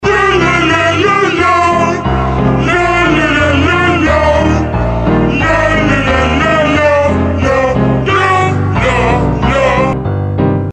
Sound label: lalalala